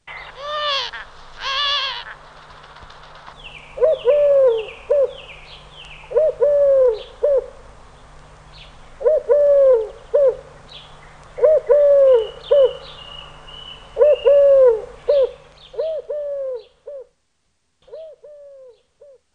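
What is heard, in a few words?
Collared dove; Madarak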